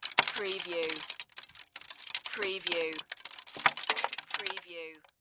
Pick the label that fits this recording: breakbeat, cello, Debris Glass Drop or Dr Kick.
Debris Glass Drop